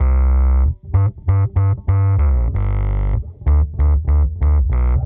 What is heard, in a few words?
Bass FX 95bpm C; musicradar; dub designer